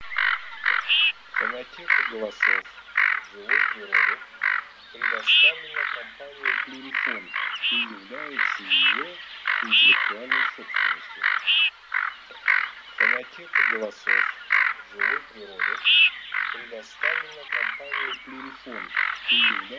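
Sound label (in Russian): Гоголь Quattrocchi, манок; plurifon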